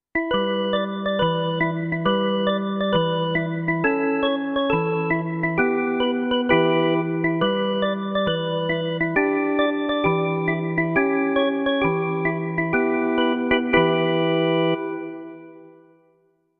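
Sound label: Perc Organ, digital piano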